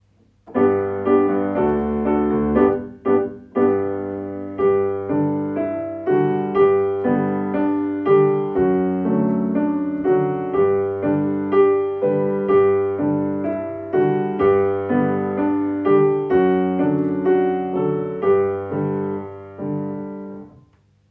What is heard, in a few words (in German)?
Klavier; Mary hat ein kleines Lamm